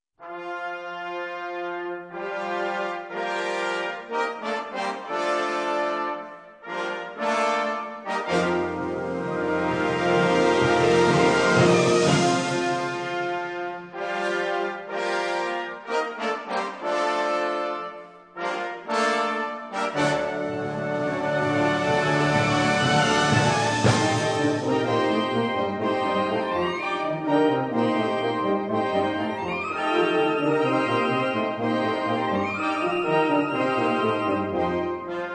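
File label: Fanfare, Band; Academic Fanfare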